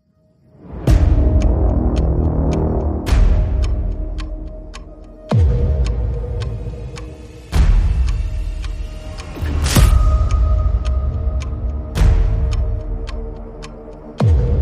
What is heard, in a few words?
Dark Trailer; Bootflare